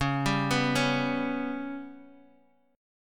B Suspended 2nd Sharp 5th

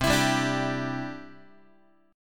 C-Major Add 9th, Guitar; strummed; JGuitar

